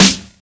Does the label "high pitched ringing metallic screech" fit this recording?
No